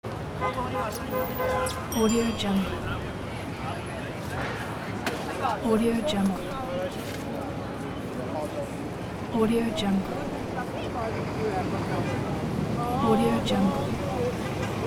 city ambience

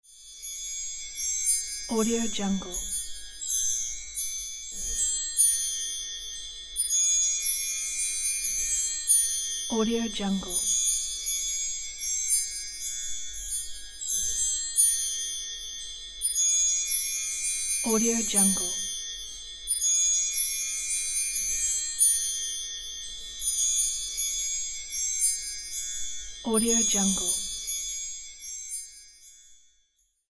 magic gleams; ssalt

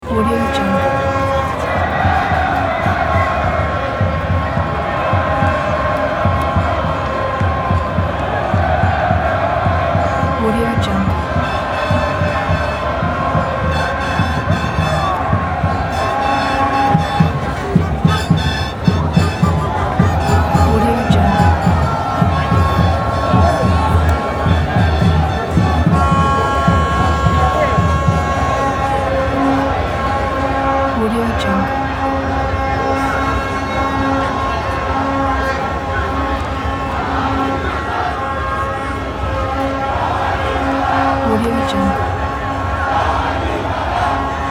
soccer ambience

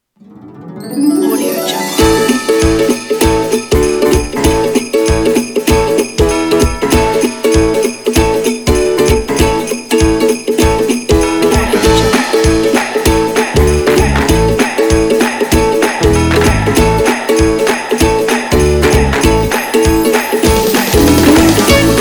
upbeat christmas

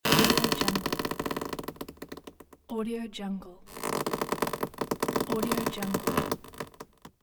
wood squeak

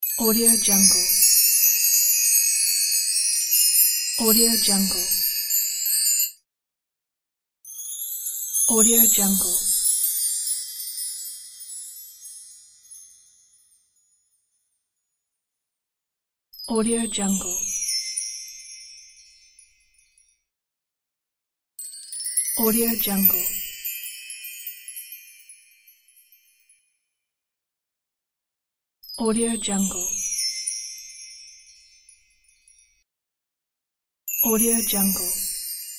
wind chimes

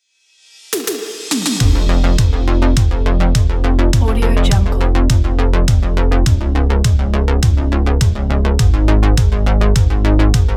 80s synthwave

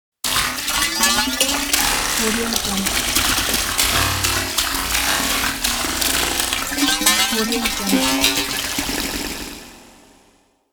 space weird liquid texture sound

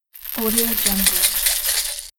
baby rattle toy shakes

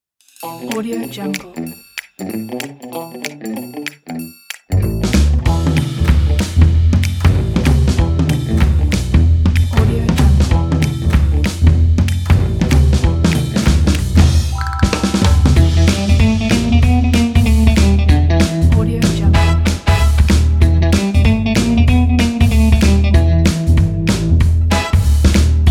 detective speed adventure

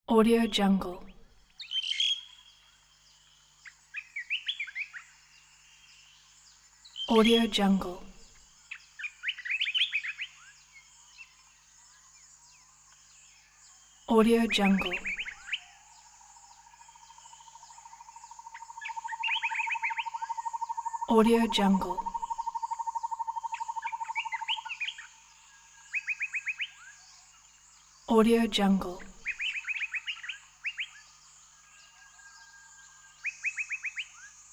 forest birds

